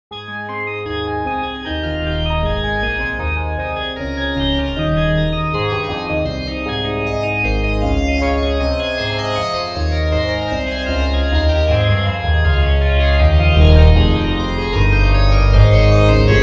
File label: church organ; polym; vicemerson